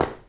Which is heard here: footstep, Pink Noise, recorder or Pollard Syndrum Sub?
footstep